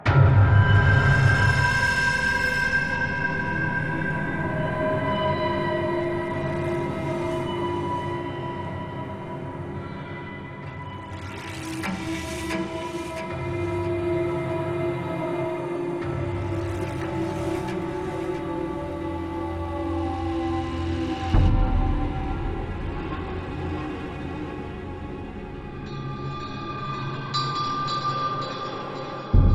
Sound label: Music Tension